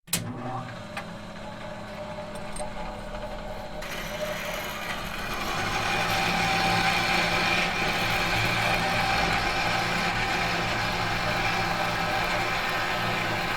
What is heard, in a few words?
metal machine hum